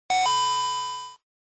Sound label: coin